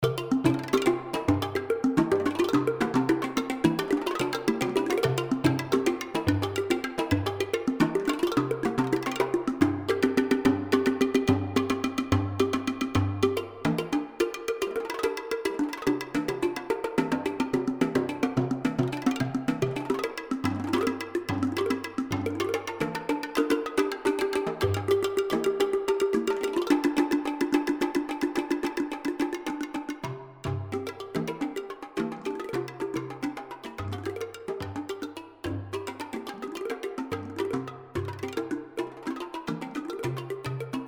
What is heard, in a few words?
drum